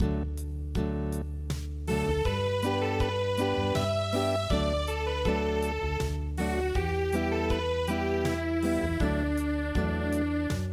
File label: Unplugged, midi; Schlaf ein mein Blond-Engelein